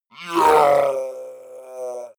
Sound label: orc grunt painful; kreaturen